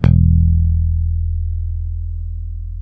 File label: HIPH E 2, Bass, HIP-HOP; Spectrasonics; Bass Legends CD 1